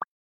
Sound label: Notification Sound Handmade